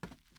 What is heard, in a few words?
wood step